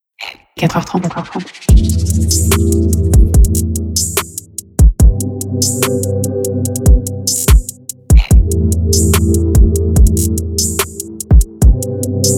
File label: 145 bpm